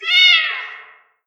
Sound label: combo break; tekkito